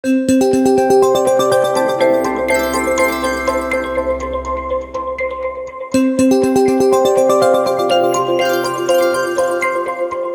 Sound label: Meditation; OnePlus 12